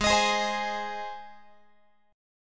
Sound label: Ab Major, Guitar; Ab strummed; x,16,18,18,16,19; 4A,4E,5A,5D,5G#,6C#